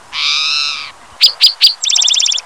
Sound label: Spotted towhee; bird